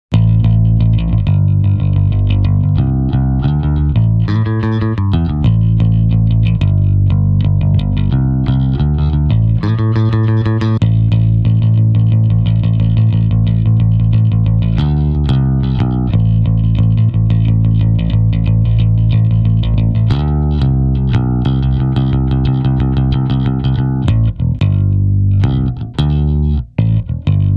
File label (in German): Piratenhymne Bass